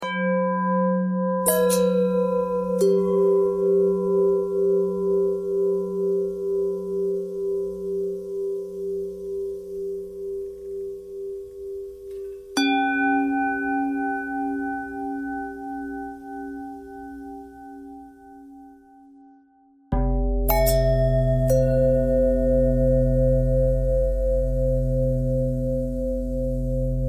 long bell bell thread simple, bells

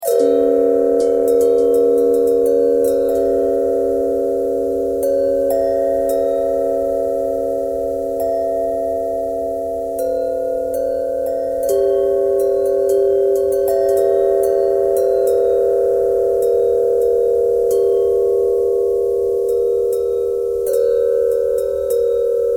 bell thread buzz, bells

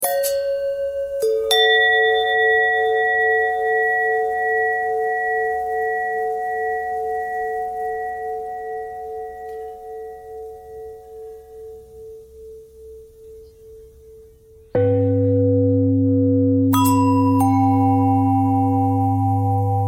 bell long bell threads

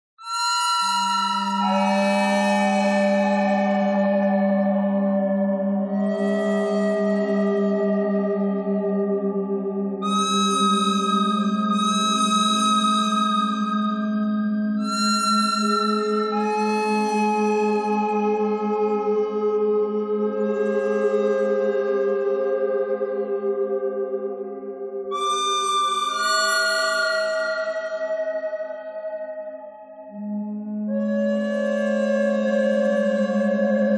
bird thread noise; noreverb

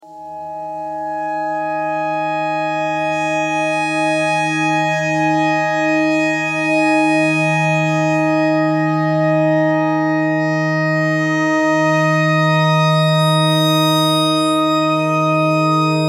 clarinet notes e

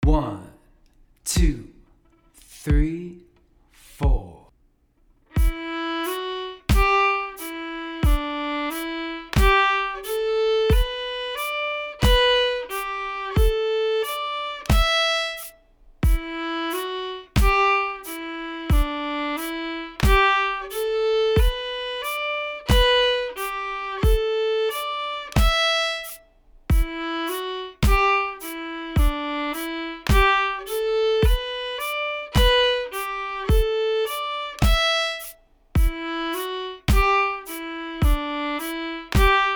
georgia shuffle blackberry exercise; fiddle

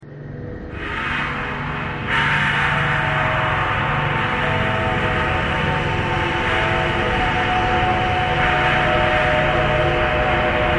thread noise

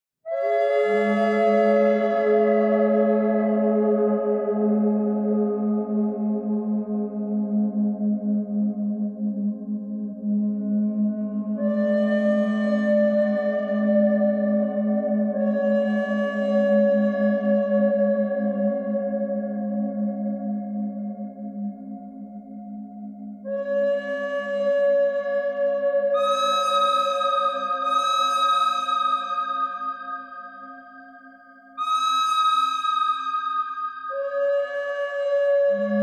bird thread noise all; birds

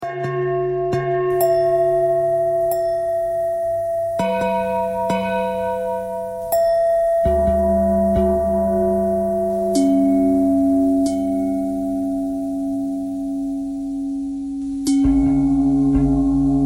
bell bell thread noise

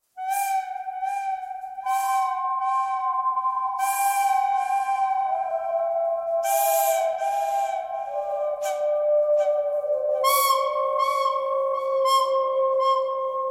bird theme bird canyon pentatonic

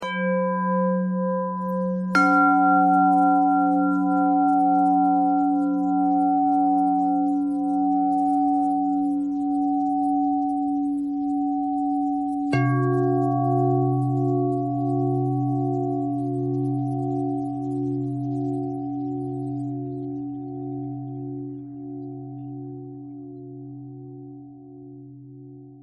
bell bell thread noise

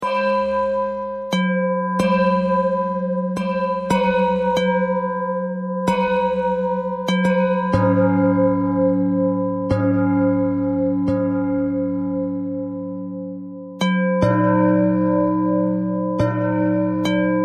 bell bell thread noise

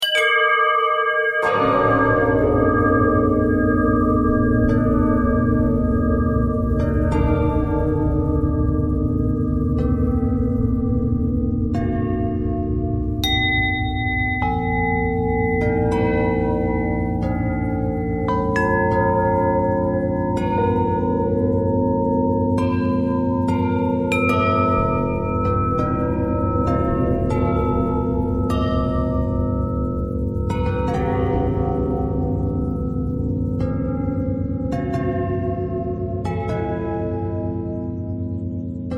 bell bendtwist

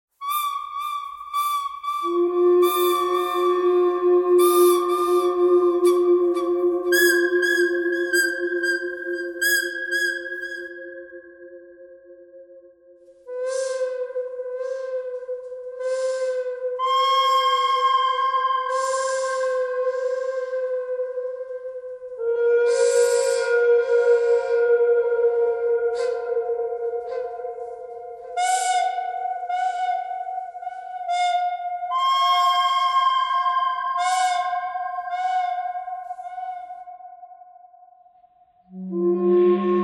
bird canyon thread harmonic; birds

